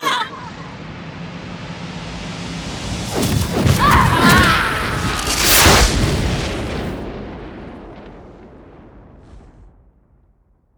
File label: spider goddess death